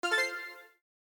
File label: success